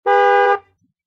Car Horn

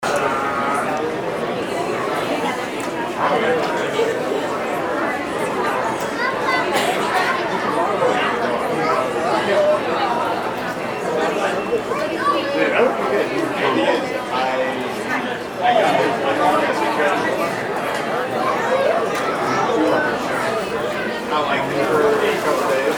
Market Ambience

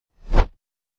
Arrow, whoosh

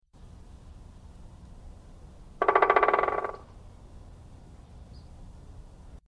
Woodpecker Pecking Tree; birds

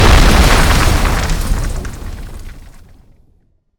Building Collapse Blast; explosion blast

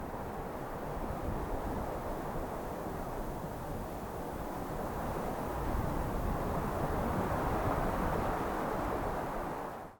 Snowfall Wind Ambience; christmas

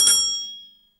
Bicycle Bell Ring

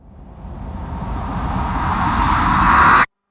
Reverse Impact Riser

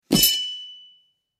Knife Slash Sound; weapon

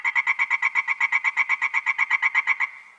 Frog Croak; Rain scenes

